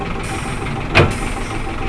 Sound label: gear